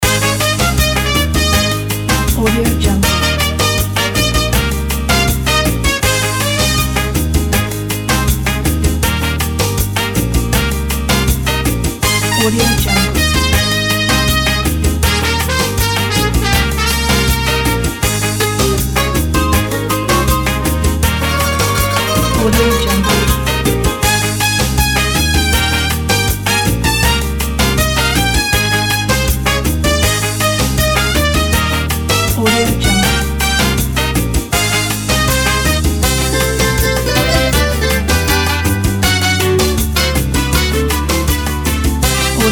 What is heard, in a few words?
happy mexican folk; cinemedia